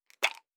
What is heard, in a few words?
Pills; Survival Game Sounds - Lite Edition; Objects and Items